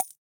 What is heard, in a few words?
Pick Up Coin